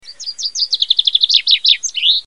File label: Chaffinch